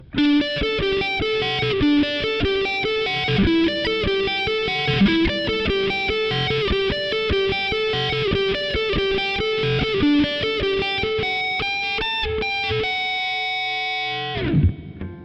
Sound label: gibson riff